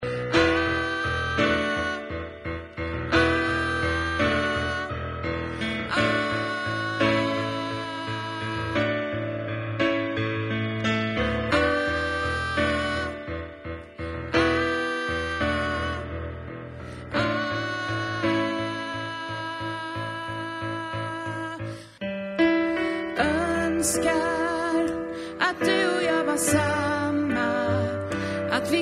tänk dig alt, stämmor

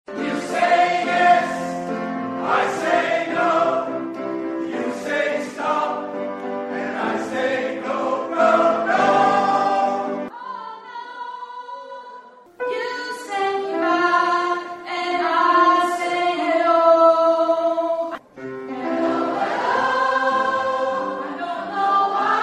bas

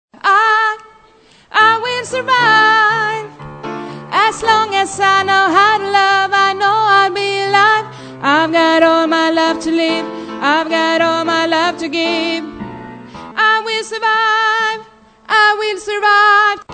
bas

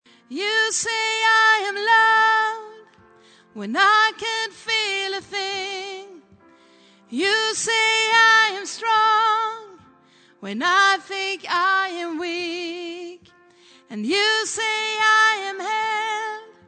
sopran, stämmor

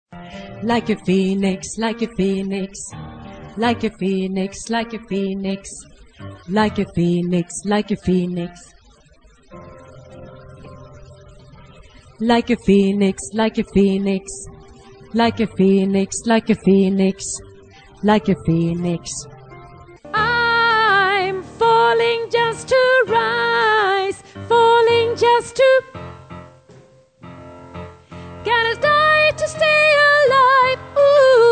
phoenix bas, stammor

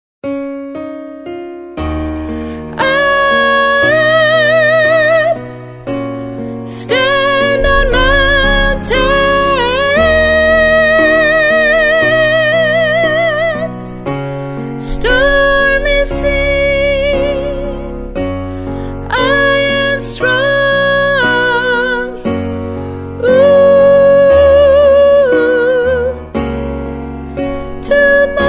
sopran, stammor

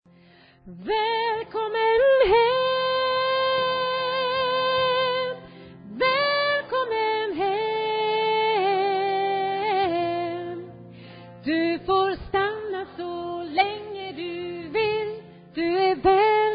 bas, stämmor

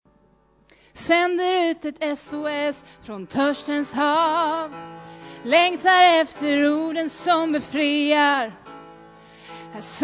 alt, stammor

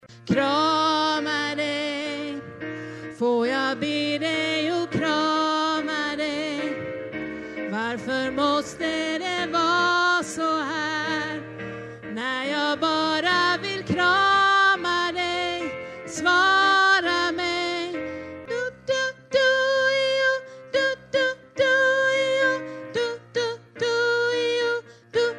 krama dej bas

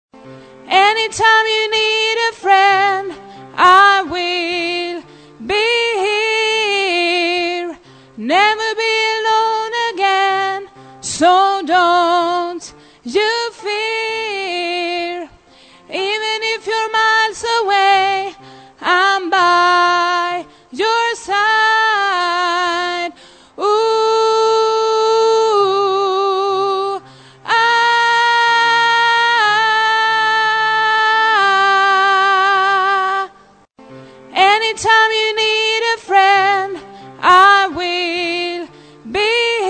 sopran

